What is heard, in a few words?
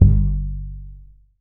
TUB BASS G 1; Country